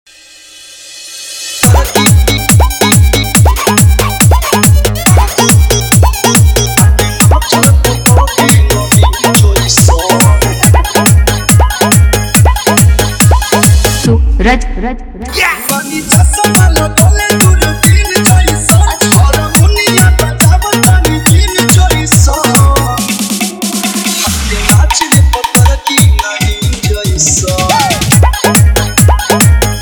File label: Bhojpuri Remix Dj Song